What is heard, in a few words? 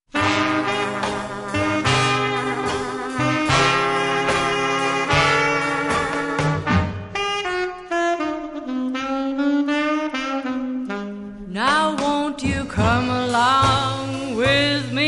blues